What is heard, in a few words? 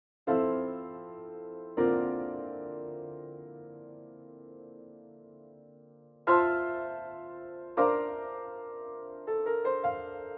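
tpt pno, trumpet piano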